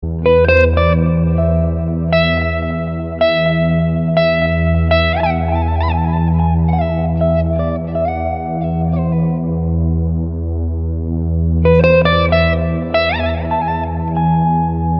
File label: raag des instrumental flute